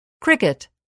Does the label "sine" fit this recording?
No